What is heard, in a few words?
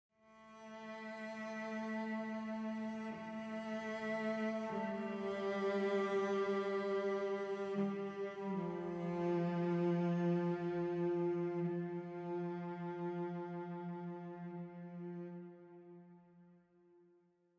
Celli 58bpm 4-4